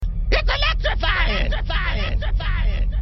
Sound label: electrifying